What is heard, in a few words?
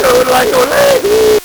LSDj, Nintendo Gameboy; 300 Drum Machines